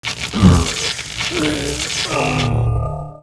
Mob die; Bossmob; 야수맹; 야수귀; 2단계

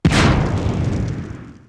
skill explo; SkillSound

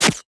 MOB BATTLE SHOTSWORD, Battle Mob Weapon

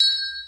DROP GLASS DROP

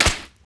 leather bash wood

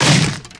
Wood Heavy Bash Flesh; Monster

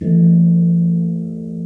bell

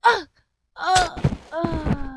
DIE, Monster; Champion; 야수맹; 창비후

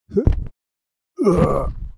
mob die; Monster; 야수맹 흑룡결; 쌍부야

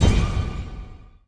error

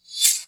Window Close; EnvSound

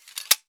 Window Close; EnvSound

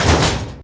metalbox

